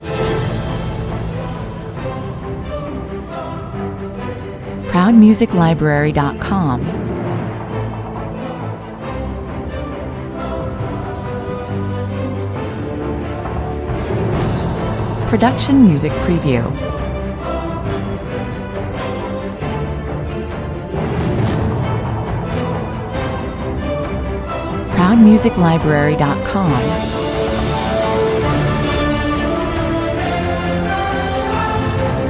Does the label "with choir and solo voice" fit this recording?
Yes